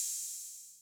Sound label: Hats Open Accent Max Decay, 808